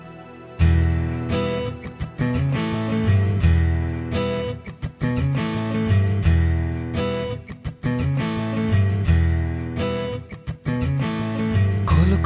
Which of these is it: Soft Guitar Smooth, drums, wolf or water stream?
Soft Guitar Smooth